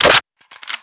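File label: weapon foley fn2000 reload chamber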